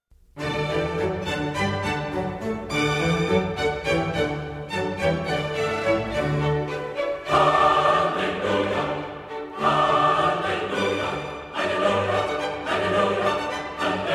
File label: chorus